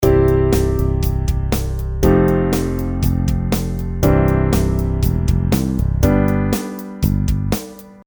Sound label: Extending Chords Beyond the Octave